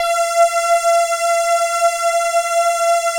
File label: APAD, ATMO PADS; Best Service; XX Large